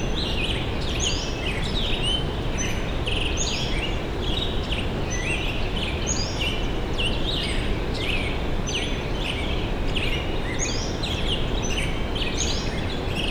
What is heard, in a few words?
bird stanislaus; field recordings; Vietnam